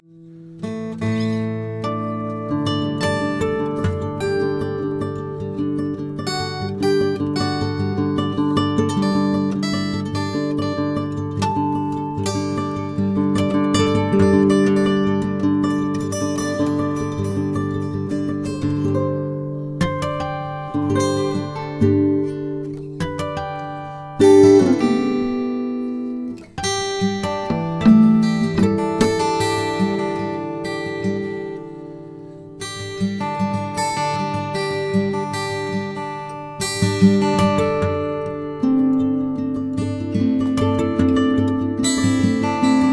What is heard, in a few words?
Camp Guitar C